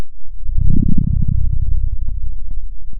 pop, Sound of a dinosaur

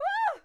fear strong; VIVAE

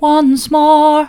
once more soft, vocal

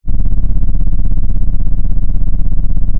pop, Sound of an engine revving

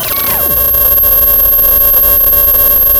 Sound of a cat meowing

